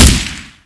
m60 norm fire

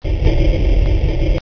pop door

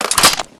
mgun clipin; weapons; deluge

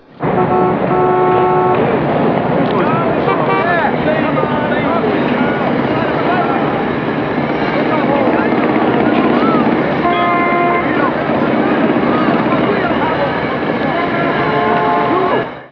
Paris traffic

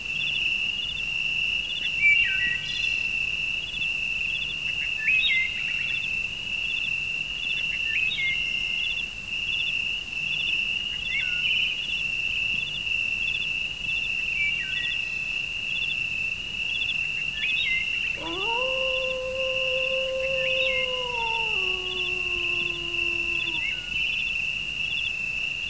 forest track; de zelan

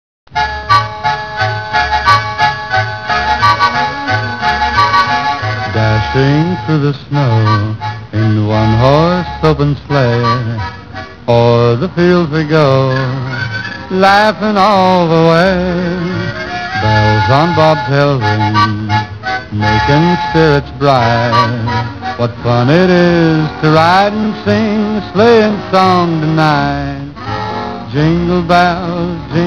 jingle bells; de NewYork

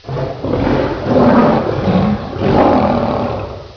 roar; colosseum

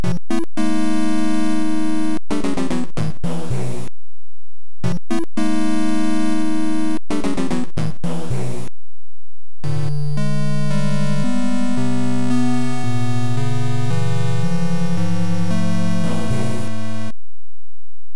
castle music

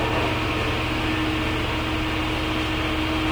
industrial; de rain

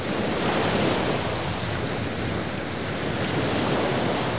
water sea

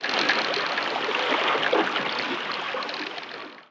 waterwheel; de unholy

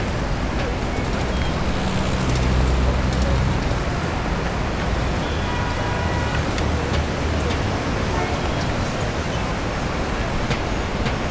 city loop

